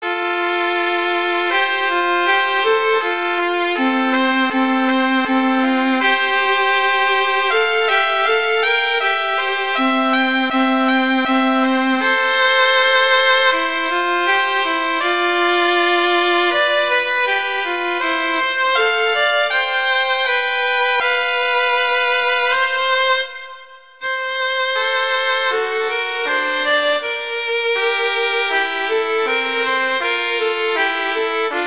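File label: Duet, horn; Mozart; Duets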